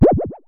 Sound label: Synare Filter; Star Instruments Synare 3